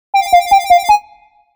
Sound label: Flutey